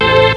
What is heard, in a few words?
tranc, techno stabs; Terra Sound Library